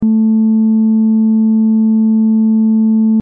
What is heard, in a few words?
saturated Sine Loop 220Hz